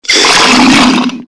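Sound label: skeleton attack; Skeletal Ravager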